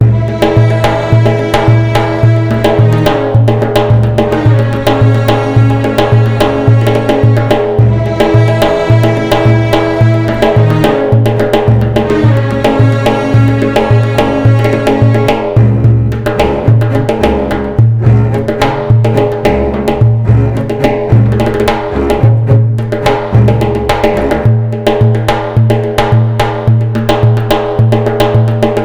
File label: percussion, arabic; arabic percussion collection